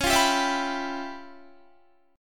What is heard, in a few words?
Db 7th Sharp 9th Flat 5th Db, down, Guitar; Db7#9b5 strummed